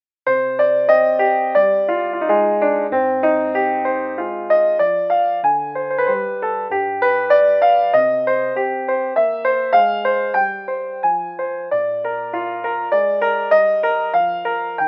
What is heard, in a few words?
Nord Grand 1955 Grand